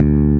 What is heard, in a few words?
bass